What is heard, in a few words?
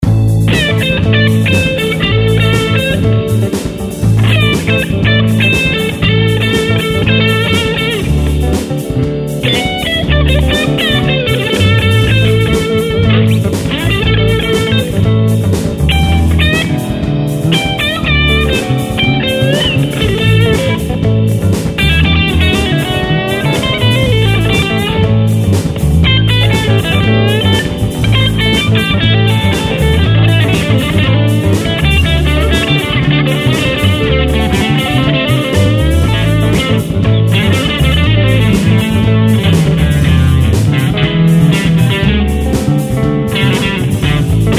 Blues In A 120 Solo Guitar; Blues Solos